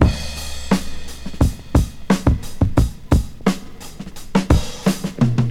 87 bpm breakbeat C# key, Free drum groove - kick tuned to the C# note. Loudest frequency: 699Hz